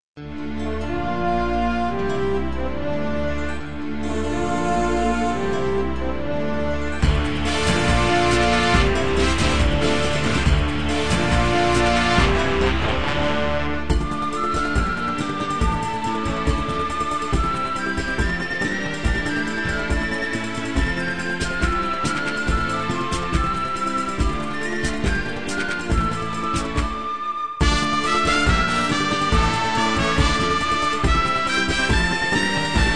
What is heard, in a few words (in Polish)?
hymn szkoly wersja instrumentalna